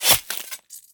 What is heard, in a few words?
flesh, combat, weapons, swingable; default swingable